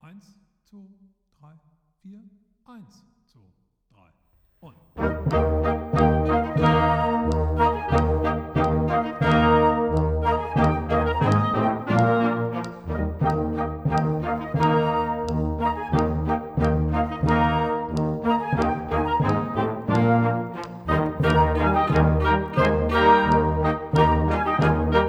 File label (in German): Vivaldi mit click